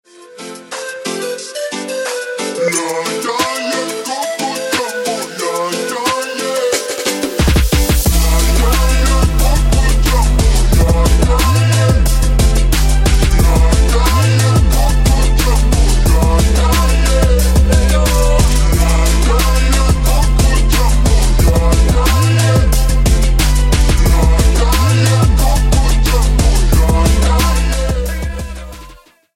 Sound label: phonk remix